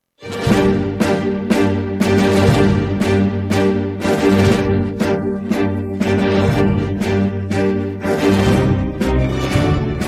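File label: backing track; normal nyye pesni dlya detei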